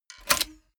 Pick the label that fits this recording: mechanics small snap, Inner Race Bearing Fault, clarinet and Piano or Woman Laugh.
mechanics small snap